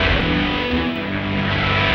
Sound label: Fat Loop, Electric Guitar